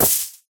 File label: equip chain, armor; Minecraft; Conquest Default